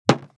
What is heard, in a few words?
drop right, single impact, large cardboard compact large box